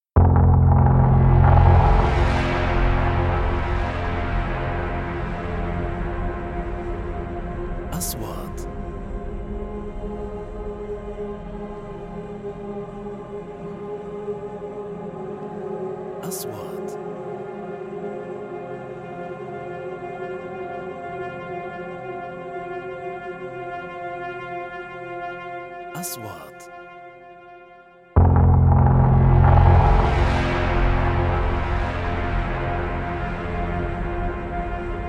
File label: Music Horror